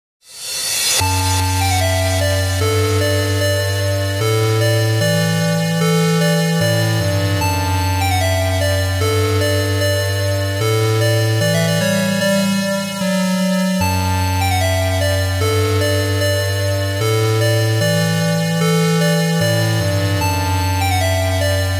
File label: psg trance mix; best of shape noise mutation saga frontier remix; saga frontier 2